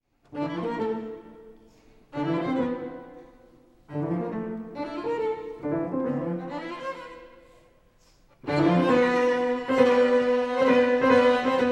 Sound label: piano trio